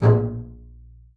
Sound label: A#1, bass spicc; Xsample VOL-5